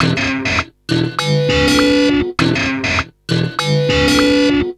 Ugly, Industrial, Nasty

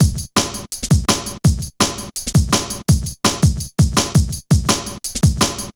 Hard Stepz B, Drum Loops 166.5

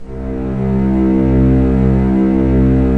STR SC, Roland LA Composer

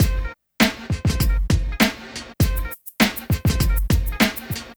Street Tip, R&B Drums

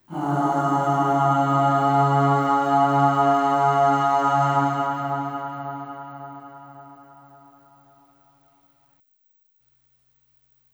Aah Long C#2 Long Reverb; Harmonic Building Blocks

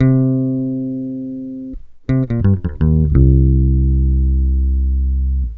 TOUC 86 CM7, Bass Grooves; USB Soundscan vol.30; AKAI

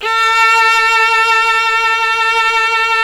Synclavier Strings; ILIO

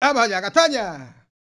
Flmnco M Vox; Roland BK-5

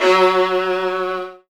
Virtuoso Strings

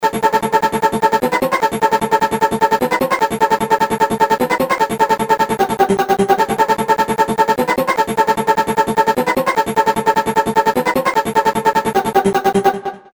Tech Notes, Synths; Industrial

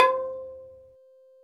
MALLET

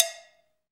PER COW, Cowbells

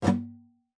GUITAR FX; Xsample VOL-2

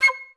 FLS STAC C6; Best Service; Peter Siedlaczek's Advanced Orchestra

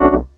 Chords Tones; Equipped Music; Loungin House

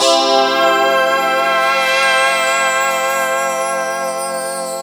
Guitar Pad; Zero-G Jungle Warfare 1, 2 & 3; Guitars

